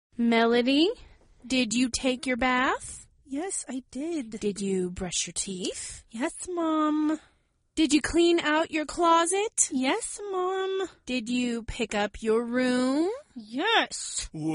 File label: melody